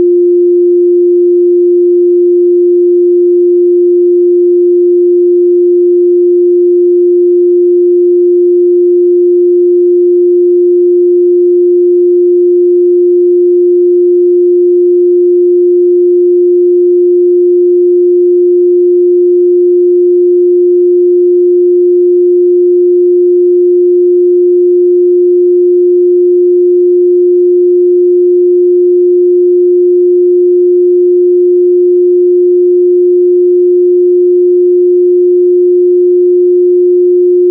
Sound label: SINE; SINE WAVEFORMS - LOG